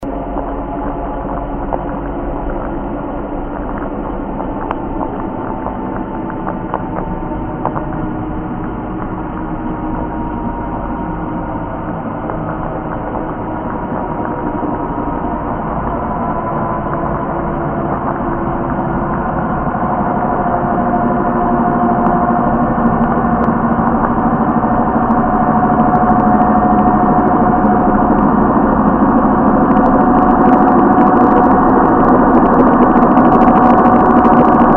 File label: Passing Panga